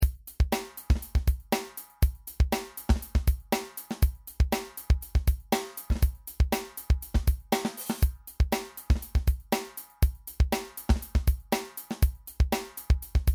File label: Drums; Psalm 150